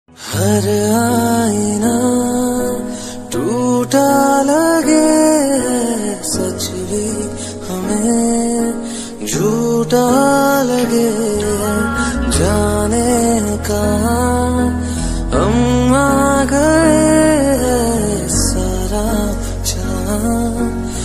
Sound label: bollywood ringtone